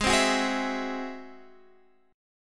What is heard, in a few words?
Ab Augmented 7th Ab Guitar Standard; strummed; x,11,10,11,9,12; Ab+7; JGuitar